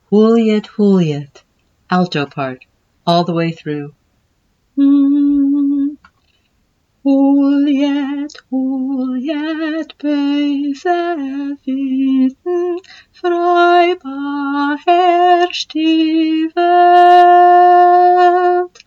alto, chorus; Hulyet

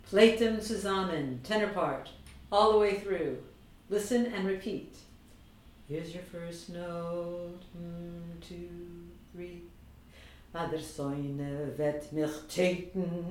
tenor; Pleytem Tsuzamen

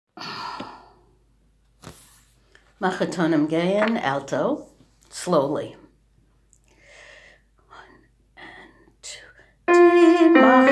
alto; Makhetonim

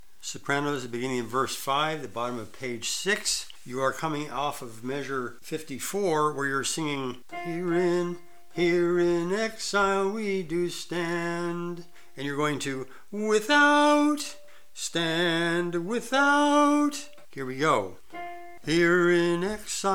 soprano; Dumai

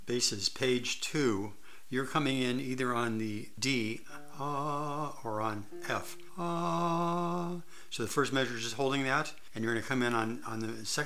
bass high; Dumai

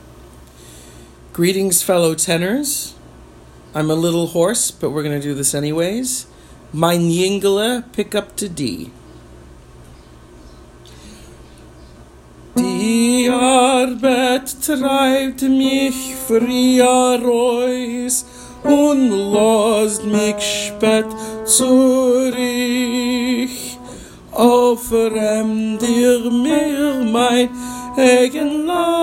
Tenors; Mayn Yingele

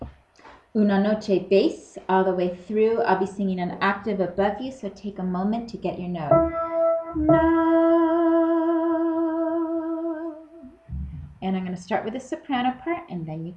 bass all the way through

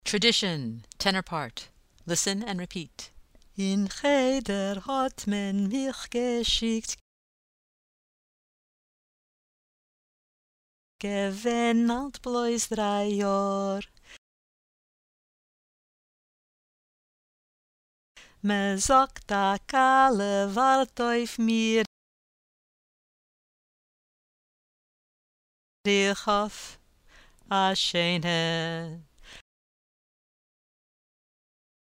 tenor drill, chorus; Listen and repeat

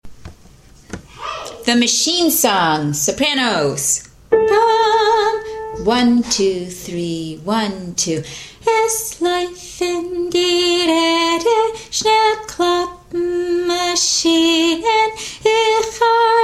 soprano

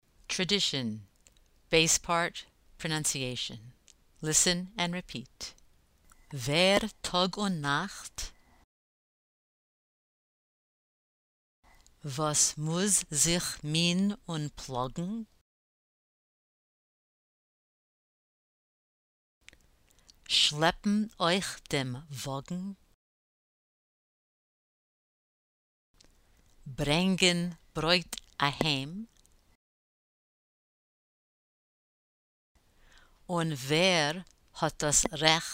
bass pronunciation drill; Listen and repeat

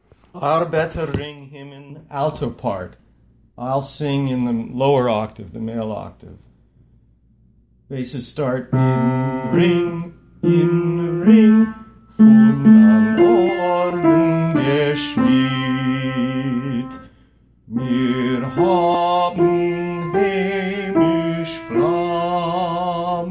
alto All the way through; arbeter ring himen

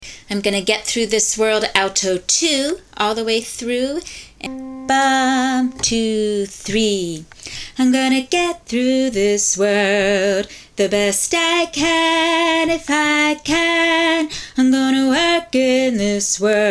Gonna Get Alto 2; all the way through

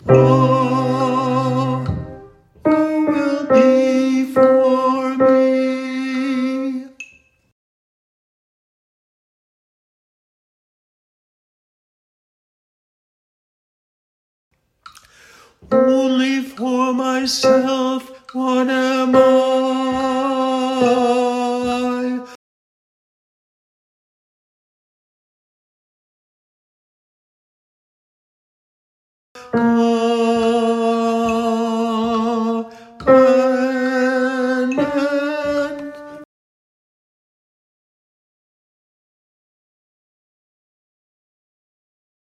tenor drill; Im Ein Ami Li

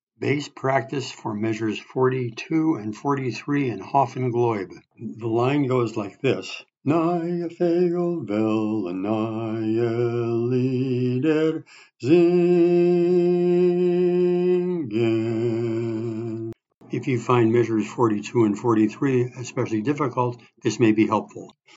Bass; Hof un Gloyb

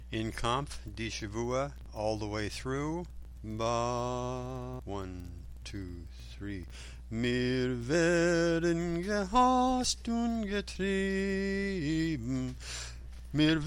Bass; In Kamf; Di Shvue

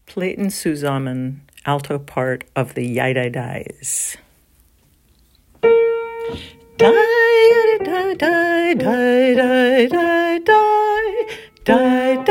Alto, chorus; Pleytem Tsuzamen

